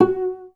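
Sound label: cellos pizzicato F#2; Best Service; Peter Siedlaczek's Advanced Orchestra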